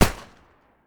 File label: MP40 Single Shot; Jagged Alliance 3